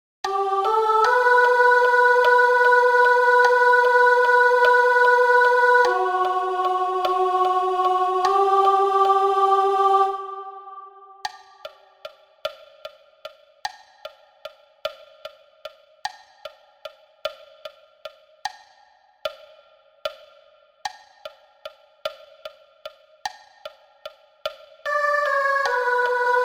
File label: SOPRANO